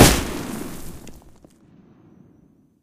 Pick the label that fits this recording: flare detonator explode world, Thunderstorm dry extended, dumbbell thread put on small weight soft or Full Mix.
flare detonator explode world